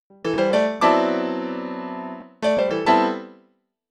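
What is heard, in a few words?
Latin Jazz Piano D major; Grand Piano; Digidesign; Pro Tools Essential Loops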